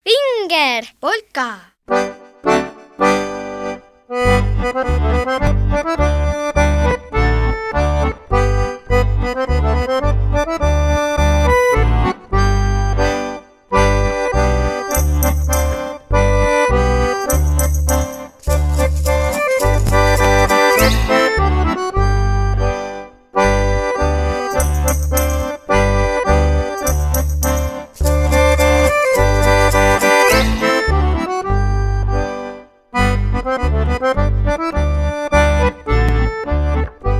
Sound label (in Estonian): Vingerpolka